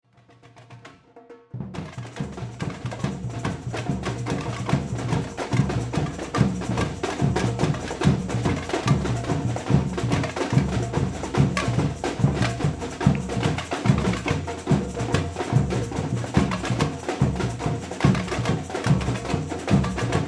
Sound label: batucada